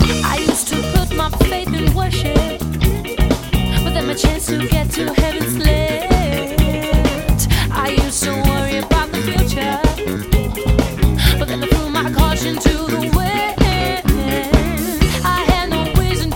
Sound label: Soul